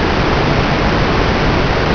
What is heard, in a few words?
h2o rush; castleargh3